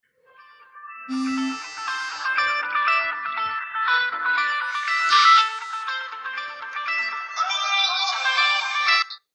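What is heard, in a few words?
vibration ringtone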